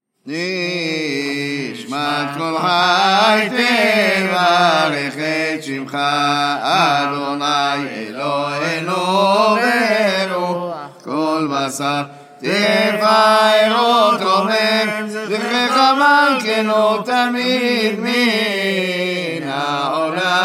Haggadah de Pessach Hallel Nishmat, Sephardic minhag; Aram Soba; Learn with proper taamim